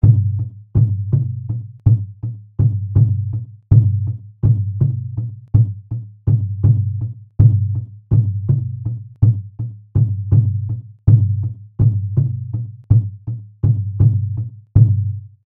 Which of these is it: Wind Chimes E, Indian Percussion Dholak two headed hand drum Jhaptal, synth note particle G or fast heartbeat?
Indian Percussion Dholak two headed hand drum Jhaptal